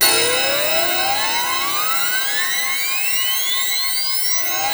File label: B4 SIRE; Northstar; The Wizard VOL-1; KAOSIREN